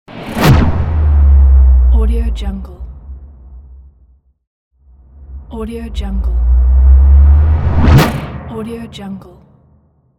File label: bullet time